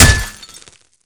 bottle broken hit flesh; tf2

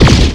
plasma; skibidi toilets toilet team requeriments; 40watt

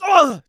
shove; Garry's Mod; tf2

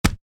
heavy taunt chest hit; vo; taunts; tf2

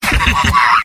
sniper laugh happy; vo; tf2; mvm

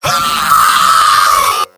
engineer pain critical death; tf2; mvm

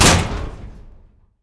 sentry upgrading; tf2

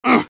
pain sharp; medic; Garry's Mod; tf2 content

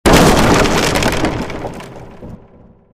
bumper car floor break; Garry's Mod; tf2

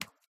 foley concrete step run; sw foley six concrete

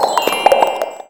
rainblower end; weapons

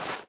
item sandwich pickup

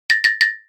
step; xylosaurus

